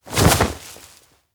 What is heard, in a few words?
get up arm grab; anemoi パッケージ版 1.1